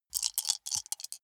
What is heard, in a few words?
small four rattle in hand closed short